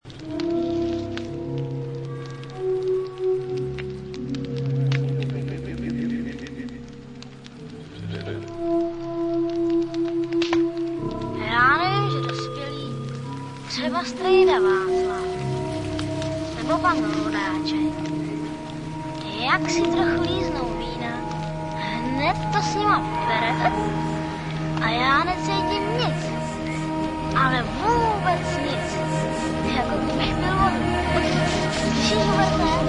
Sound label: AMBIENT EXPERIMENTAL